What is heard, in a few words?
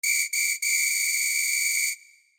whistle